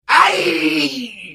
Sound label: Hit; The Elder Scrolls III - Morrowind